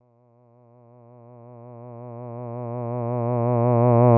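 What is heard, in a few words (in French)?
A, un "a" d'une voix de basse, variation de l'amplitude A de 20 à 5000, avec vibrato; sans vibrato; AteliersHenrich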